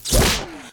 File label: staff fire